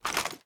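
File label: assault rifle raise first start; viper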